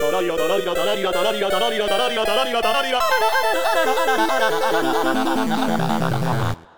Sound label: Jingle Ending Curtain; Dandy Dungeon Legend of Brave Yamada